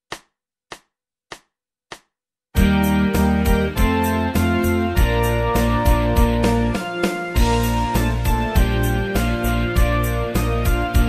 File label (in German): Schulband Musizierklassen Hello Everybody Amerikanisches Volkslied; keyboard piano